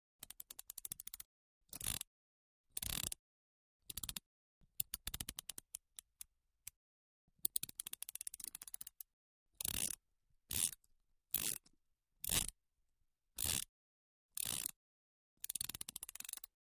ratchet various, ratchet wrench

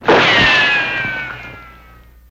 Bullet Ricochet Ban, riccos

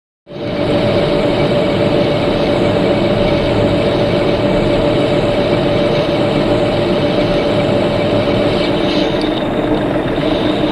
Lathe Constant Lathe; lathes

